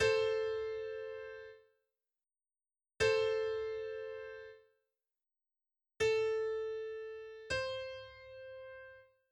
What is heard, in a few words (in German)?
intervall; Musik; Realschule